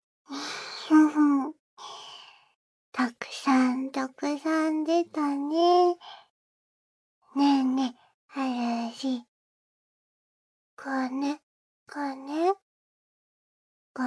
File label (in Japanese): お掃除フェラ ねっとりフェラ; 【フェラチオ】お掃除フェラ→ねっとりフェラ